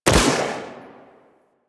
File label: Pistol Prime Shot B; Bard